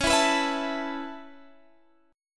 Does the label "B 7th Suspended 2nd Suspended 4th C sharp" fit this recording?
Yes